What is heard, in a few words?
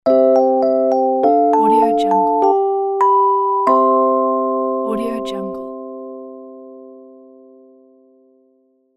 kids electric piano transition